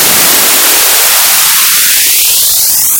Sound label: Noise Cheby B Highpass